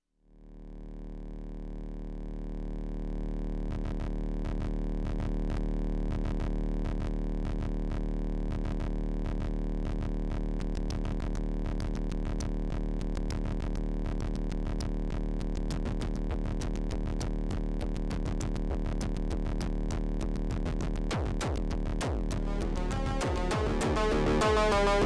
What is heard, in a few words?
january noise